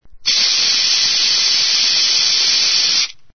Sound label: Spray SPRAY